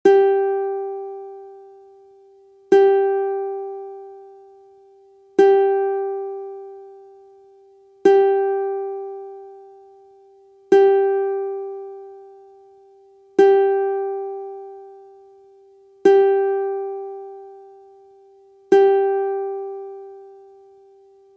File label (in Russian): 1 struna Zvuki strun ukulele